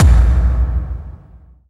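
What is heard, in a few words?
bass drum FX, dance drums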